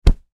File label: Impact Human Body Punch; Human Body Hit Hard Punch; Bodies; Sound Effects:Human; Blastwave